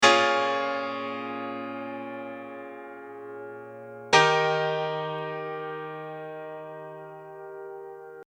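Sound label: bridge chords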